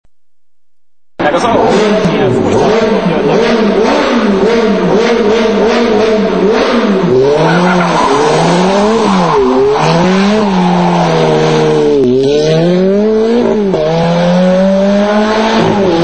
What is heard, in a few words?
Ferrari F430 tunnel; Passage sous un tunnel